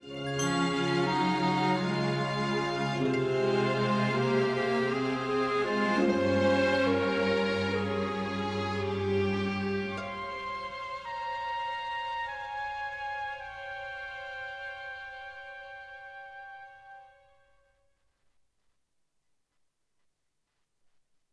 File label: SCENE CHANGE; Beauty and the Beast